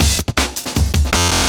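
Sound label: Glitched out Break, Drum Loops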